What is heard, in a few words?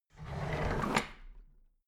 drawer big clatter open heavy